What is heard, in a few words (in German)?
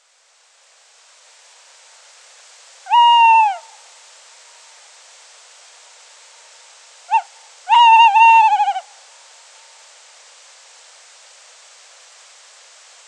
Waldkauz Ruf; hamburg norderstedt